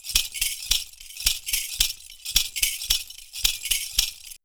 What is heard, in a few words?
PERCU; Univers Sons; Raricussions